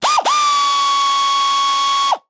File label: Air Ratchet Dry; Blastwave FX